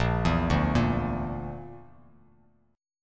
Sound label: A sharp Major Flat 5th, Bass Standard; arpeggiated